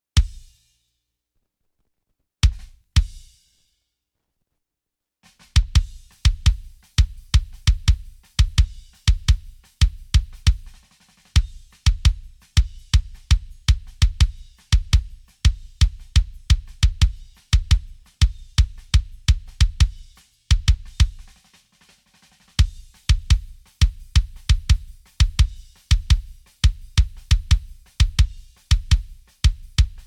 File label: Kick Drum; multitrack